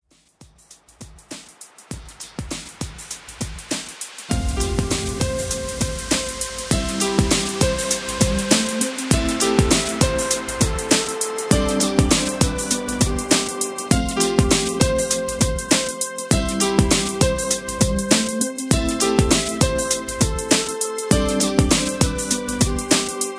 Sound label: Club Classic Mix 100 Bpm